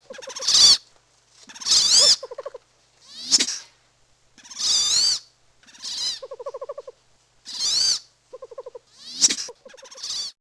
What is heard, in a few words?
Nature Wood Duck; DKMM Nature